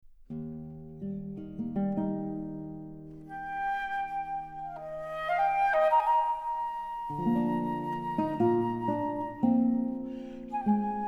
flute sonata adagio

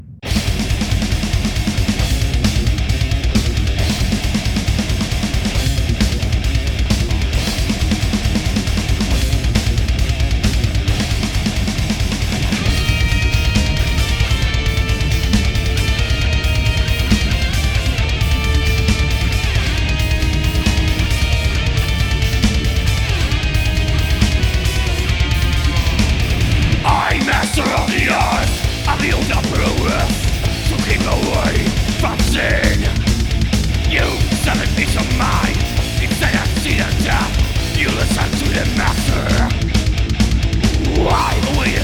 house of ambience

